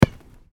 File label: ball kick; csgo